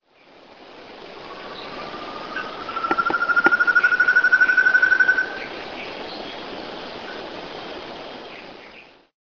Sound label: Chamaeza ruficauda Tovaca de rabo vermelho